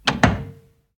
Door Close; the 39 steps

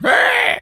pig scream short; Infernal Radiation

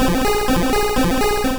Smog

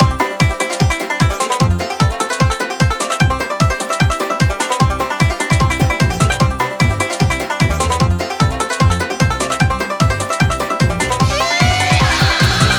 Kart Racing Goldmine Intro; boom karts multiplayer kart racing android

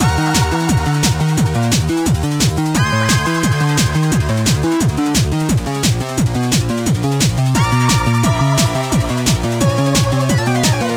Demon Music; Laser Kitty Pow Pow

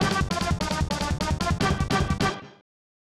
Versus Announcement

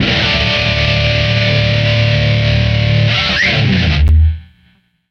Guitar Power

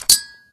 weapon; misao

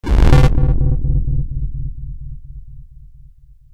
Enter Sound

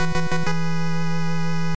Fanfare (Joining a Group); fire emblem gaiden family computer

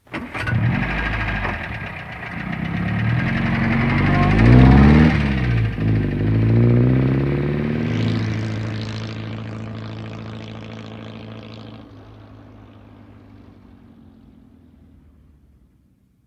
Car Start Drive Away Fast

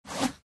Ninja Swipe; Fancy Pants World 1 Remix

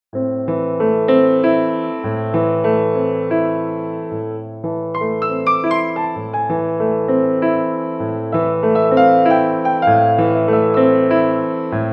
Bayou Piano; MySims Agents

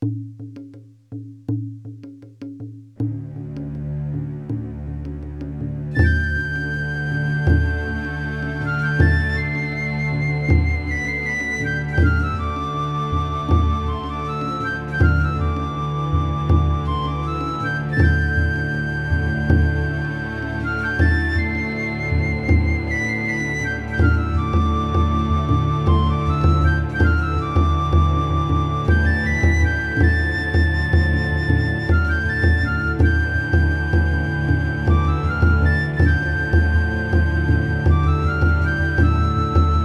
Eskimo - Arctic; anno 1503 gamerip

